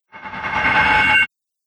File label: Metal Motion Stops